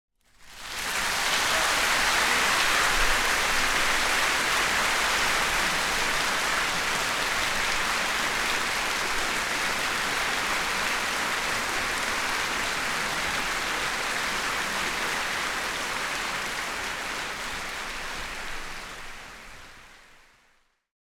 Applause - Large Crowd - Shorter Interior Music Hall Orchestra; Hollywood Edge; sound designer's toolkit v2